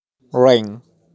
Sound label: Rain